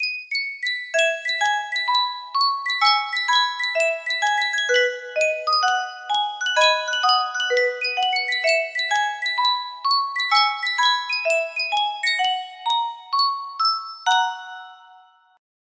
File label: yunsheng music box